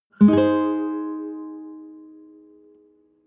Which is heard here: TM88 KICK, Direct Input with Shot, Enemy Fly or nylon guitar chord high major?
nylon guitar chord high major